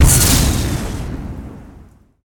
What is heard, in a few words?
Chain Lightning bounce; Maelstrom